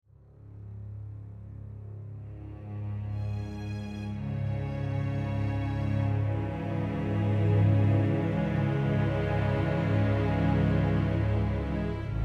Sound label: Strings Track